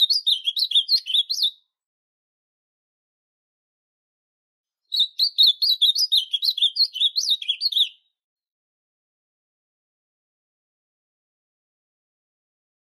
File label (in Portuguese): CANTO AZULAO